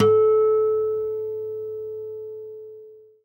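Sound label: harmonic, Guitar; Quantum Leap; Guitar & Bass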